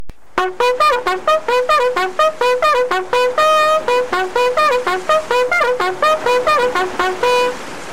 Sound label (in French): clairon